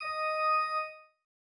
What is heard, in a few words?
organ